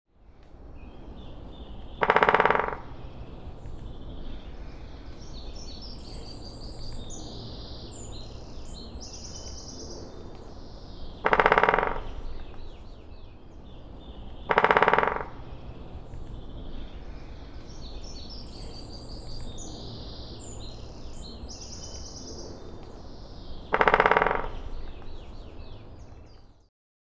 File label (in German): Vogelstimmenspiel Buntspecht